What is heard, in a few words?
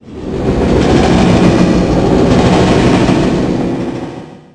subway